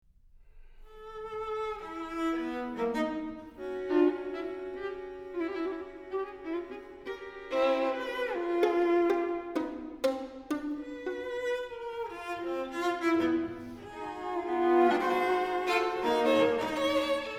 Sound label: string quartet